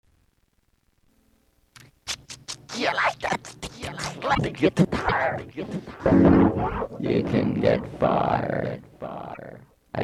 A Strange Electric Guitar Song; live recordings

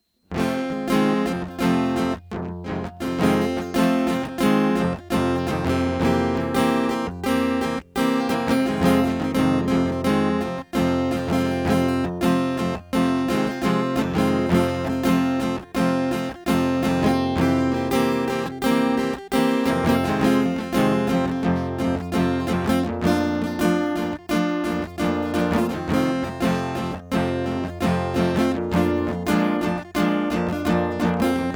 Guitar Solo

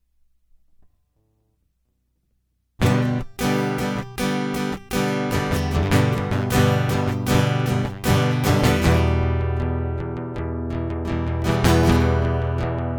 Guitar Loop

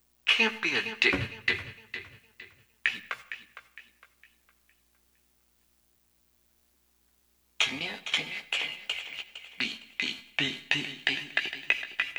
Prelude

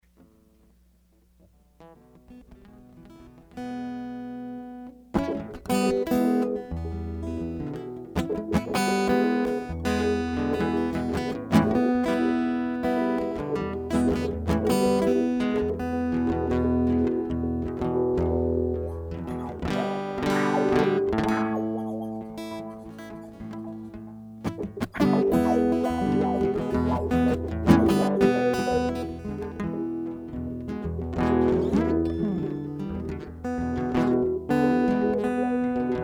guitar

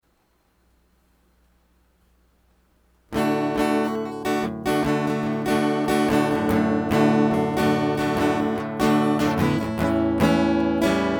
In The Dark Guitar; hyper terrestrial

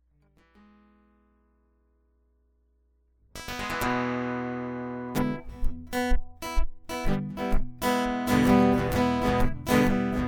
Guitar Ditty; hyper terrestrial; Is There Sound In Space

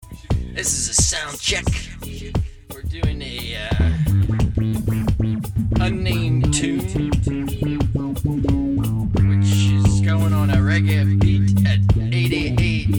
Uplifting Soundcheck

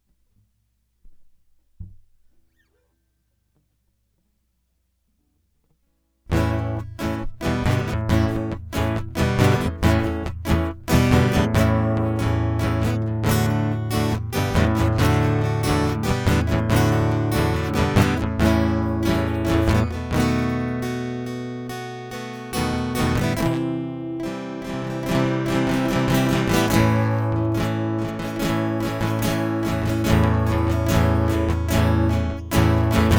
Guitar Loop

